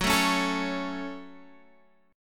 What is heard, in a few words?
F sharp Minor F sharp, Guitar Standard; strummed; JGuitar